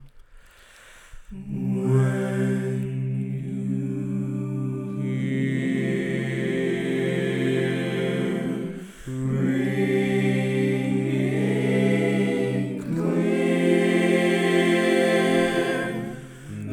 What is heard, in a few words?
8 Part Tag All Parts, barbershop